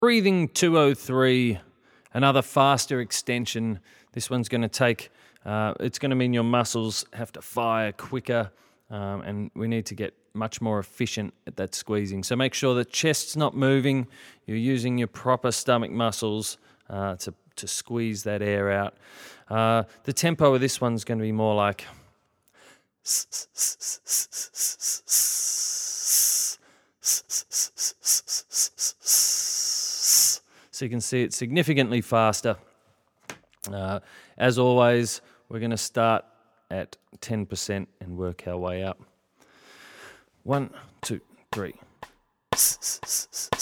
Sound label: breathing, singing